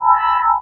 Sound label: med tone; Dystopia; implants